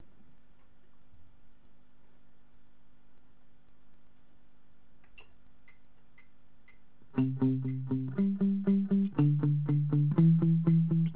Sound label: palm muting